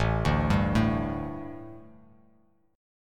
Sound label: A-7th Suspended 4th-A-5,5,5,2-8-down; A7sus4 arpeggiated